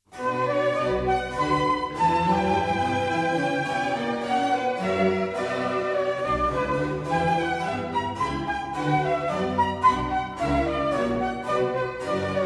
Orchestral Suite in B Minor; J.S. Bach Orchestral Suite No. 2 in B Minor, BWV 1067

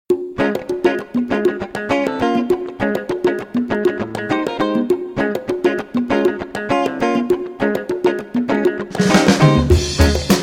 Instrumental Funk

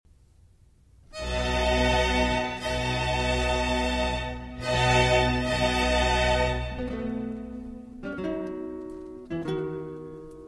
Concerto in B Minor for 4 Violins

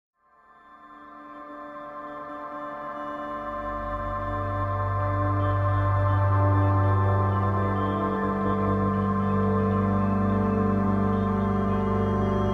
Atmospheric Lightness

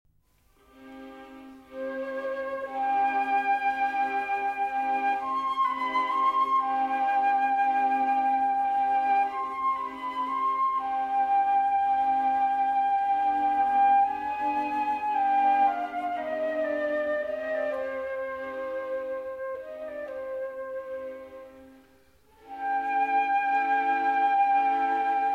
Concerto in G Minor for Flute & Strings

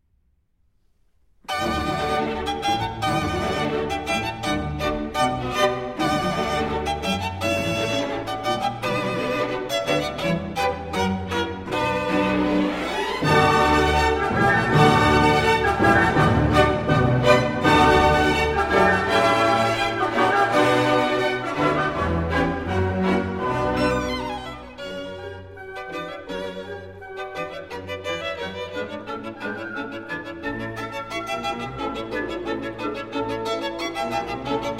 Violin Concerto in D Major; Op. 77; Brahms

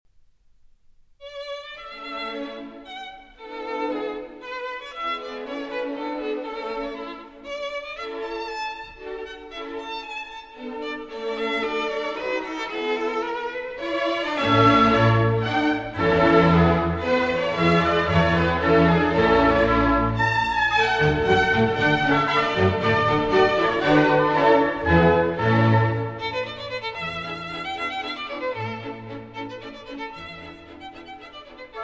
Violin Concerto No. 5 in A Major; K. 219; Mozart

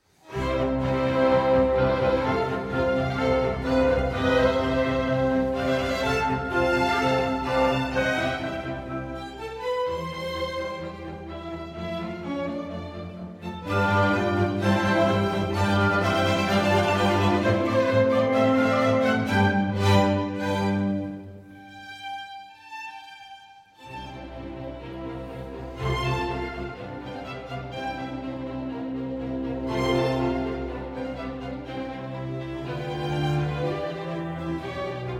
Oboe Concerto in C Major, Allegro aperto

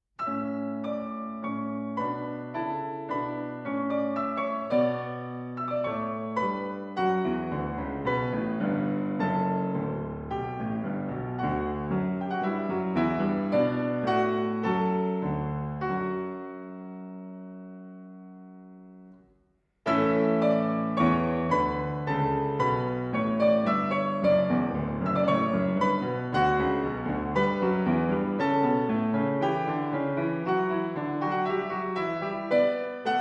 Russian Folk Songs; Tchaikovsky 50 Russian Folk Songs, TH 176 - 15. Rise up, Rise up

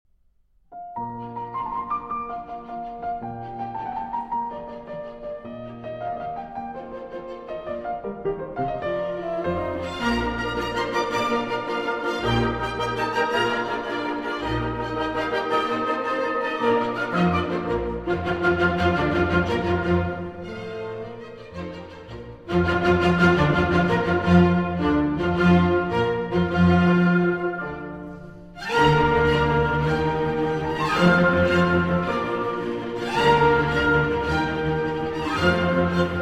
Piano Concerto in B-Flat Major Allegro; Piano Concerto No.15 in B-Flat Major, K. 450; III. Allegro

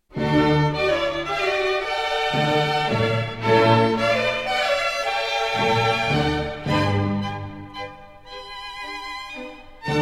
Serenata notturna in D Major; Mozart Serenata notturna in D Major, K. 239 - II. Menuetto

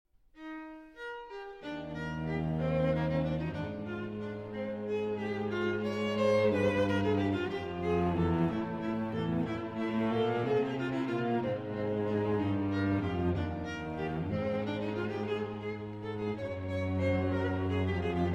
String Quartet in G Major; Shostakovich String Quartet No. 6 in G Major, Op. 101

